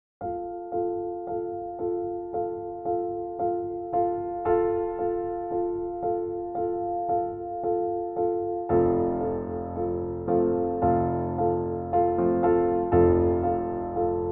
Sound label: Jingle Bell Sketch